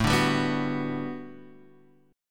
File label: A 13th Suspended 4th A, guitar chord; strummed